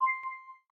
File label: light touchdown; LG G Vista VS880